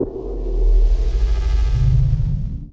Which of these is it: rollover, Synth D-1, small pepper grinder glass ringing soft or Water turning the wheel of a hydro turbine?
Synth D-1